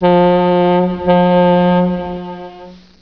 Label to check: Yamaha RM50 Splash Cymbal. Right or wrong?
Wrong